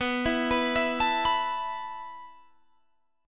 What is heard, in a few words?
E Suspended 4th B